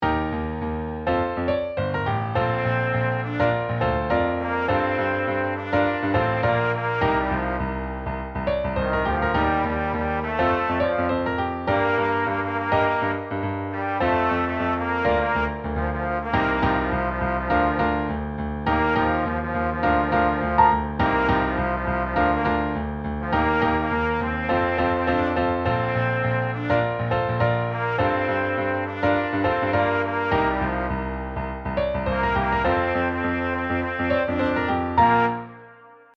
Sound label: piano baritone melody